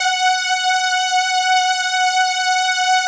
POLY SYNTH; Wizoo - Powered Wave

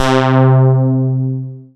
SYN, analog; Sound & Vision - Gigapack I CD 2 (Roland)

